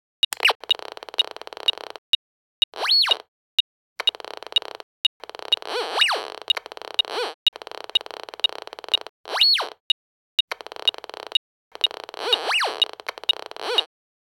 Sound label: Metal Detector Looking; operation-arctic